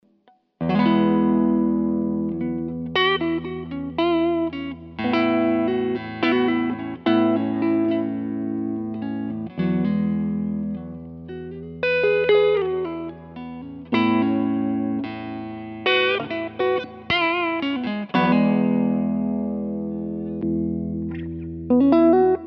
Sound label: Carl Martin PlexiRanger Boost Full